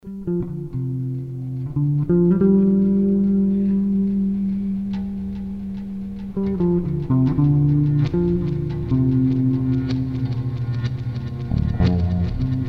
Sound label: Improv Ambient Bass Jamm In The Key Of D